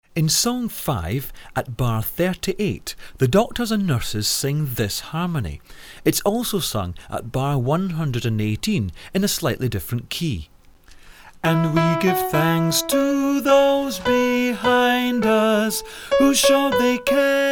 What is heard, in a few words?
song harmonies